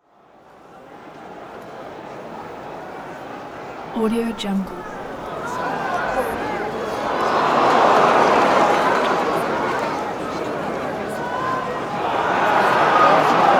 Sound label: sports crowd cheers reactions ambience sound pack; bestsfx